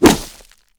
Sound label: incoming javelin hit ground; Mount&Blade Warband